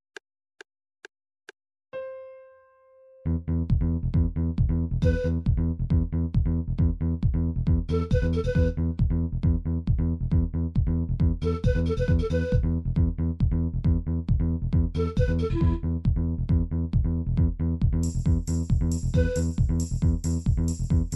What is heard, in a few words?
trance